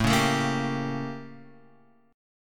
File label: A 7th Flat 9th Guitar Open F; A7b9 strummed; JGuitar